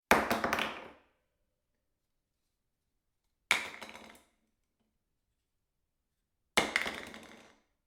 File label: dropping object in bathroom